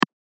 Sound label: switch off